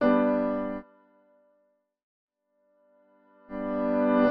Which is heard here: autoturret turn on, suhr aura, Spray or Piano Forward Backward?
Piano Forward Backward